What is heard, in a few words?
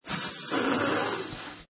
Balloon Inflating; Balloon inflating 2. Blowing air into balloon, balloon inflate.; Balloon Sound Effects; Cartoons; Lynne Music